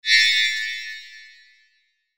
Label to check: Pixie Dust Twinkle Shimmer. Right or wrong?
Right